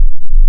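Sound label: waveform FM sine sine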